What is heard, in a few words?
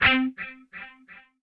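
mute distortion guitar c5; PC Gamer IT CD 40; SMACDEMO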